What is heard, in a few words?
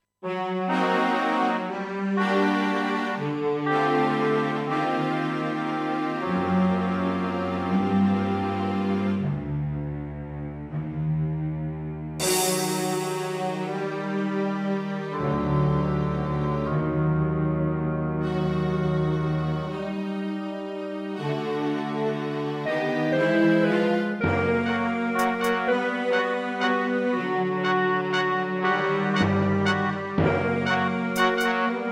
SC880; QFG3